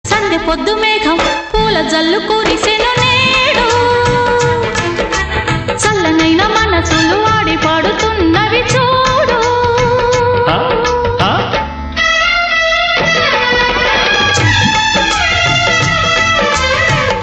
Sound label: Female